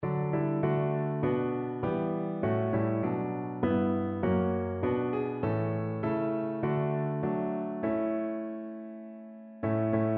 thou didst leave, piano

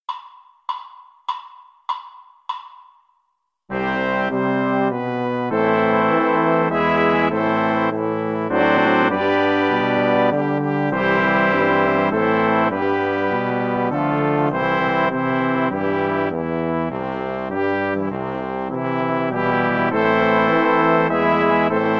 horn, French Horn

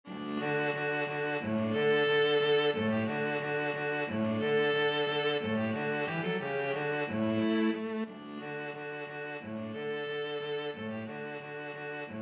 viola cello duet; vivaldi spring

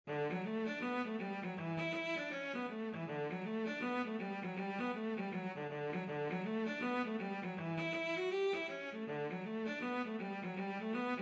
cello, Irish Trad.; Lady Forbes

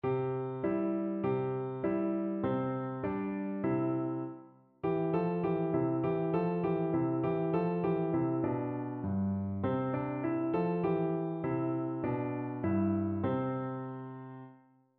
tsuki, piano

